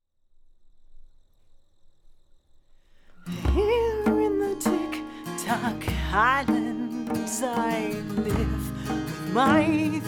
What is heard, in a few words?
djembe